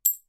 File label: drop; misskey; drop-and-fusion